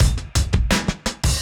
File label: Beat Style E 170, breaks; 170bpm